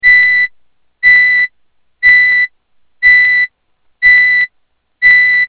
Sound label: Alarm Electronic; Blackberry Bold